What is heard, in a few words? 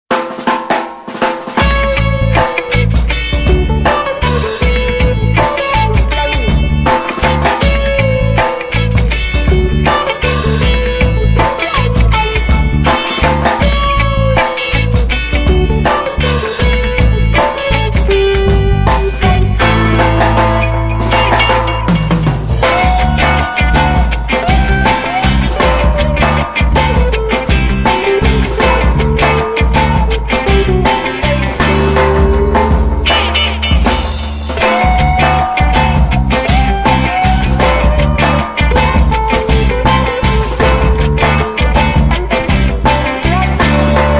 Reggae